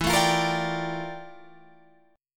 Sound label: F Major 13th E, guitar chord